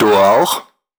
Vocal Phrases; Vengeance Samples; Vocals

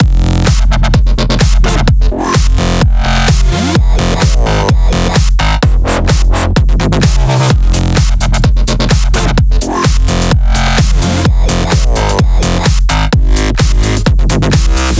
128BPM Mix; Vengeance Samples; Spring Kit

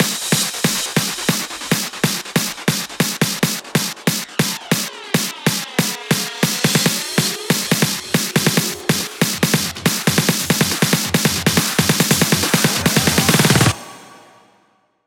140BPM 8Bars, HandsUp & Trance Fills; Killer; Vengeance Samples

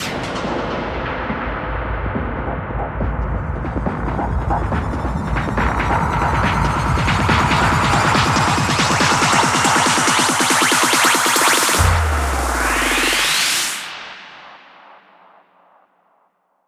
140BPM 8Bars, Hands Up Trance Fills; Kimono; Vengeance Samples

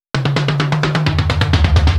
Fill 128 BPM; Vengeance Samples; VEH1 Loops

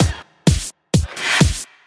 Housebeats, 128BPM; Vengeance Samples; VEH2 Loops

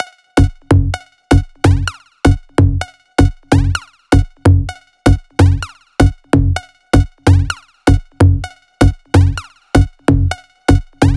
128BPM Melody; Vengeance Samples; Radiate Kit

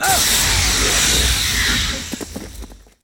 Impacts

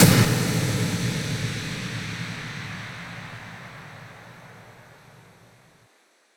Impact; Vengeance essential club sounds-3

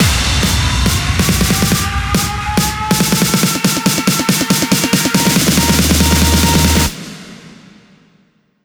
140BPM 4Bars, HandsUp & Trance Fills; WeeFrilly; Vengeance Samples

